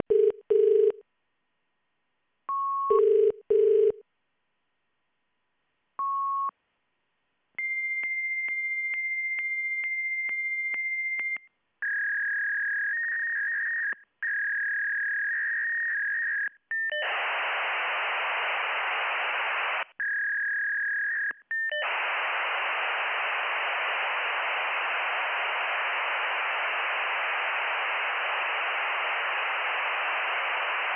ECM call

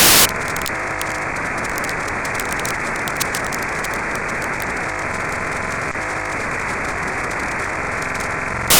P25 Audio

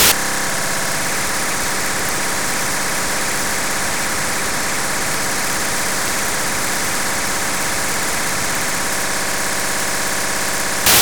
DMR Voice Weak

